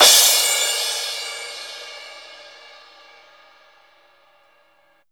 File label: CYM CRASH; USB Soundscan vol.27; Ragga & Dub Reggae; AKAI; CYMBALS K